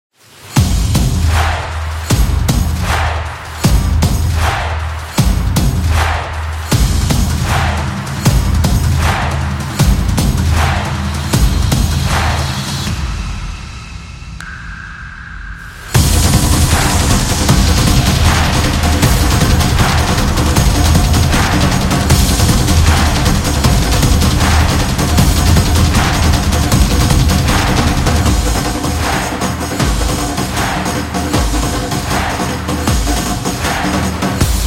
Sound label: The Sports Music, percussive